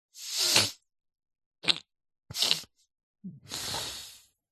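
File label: human male asleep breathing interruption mouth; zapsplat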